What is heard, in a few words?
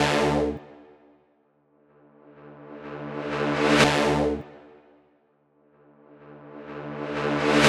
synth 125 C